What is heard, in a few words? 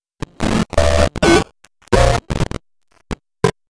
game glitch